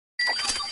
notification

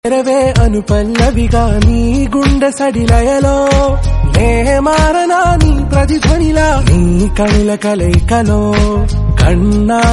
telugu song

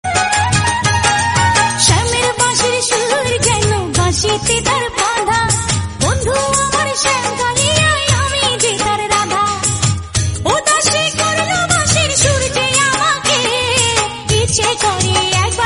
devotional

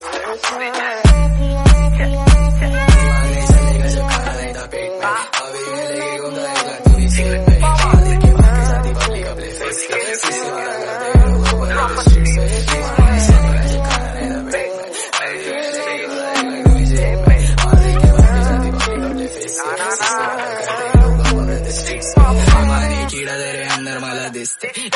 instrumental

